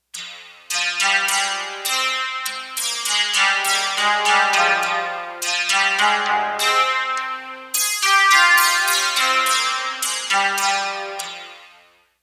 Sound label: Shamisen; Digital Synthesizer